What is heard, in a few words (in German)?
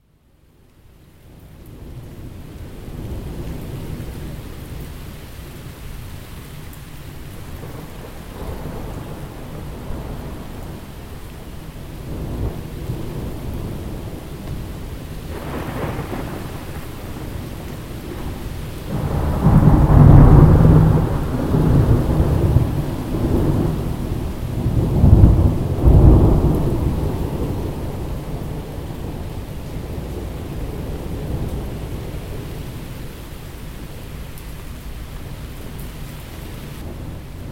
Gewitter